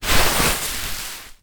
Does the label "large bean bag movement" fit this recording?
Yes